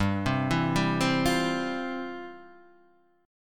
F Major 7th Suspended 2nd

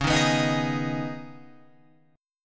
Db 7th Suspended 2nd Db, down, Guitar Open F; strummed; JGuitar

